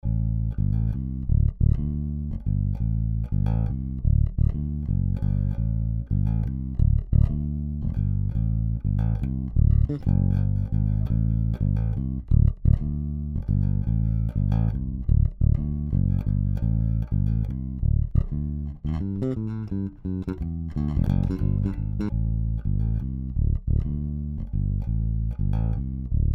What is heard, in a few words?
Bass Dry Wet; OpenDeck